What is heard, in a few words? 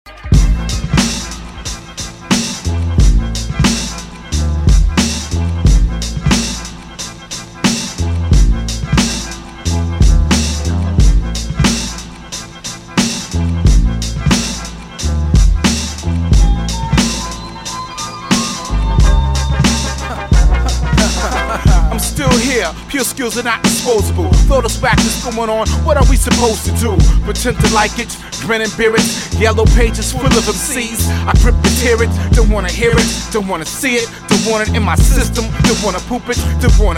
polyrhythm break glass